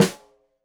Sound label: Snare Stereo, Drums; Deeply Sampled Drums; SMDrum